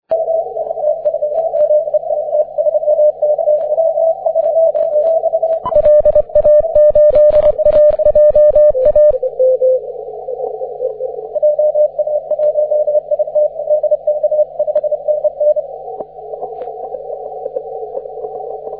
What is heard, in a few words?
30m cw